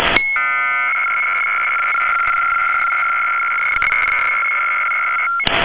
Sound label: RAC-ARQ MEROD 266 66 Baud 800 Hz